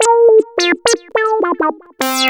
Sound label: lead (brass)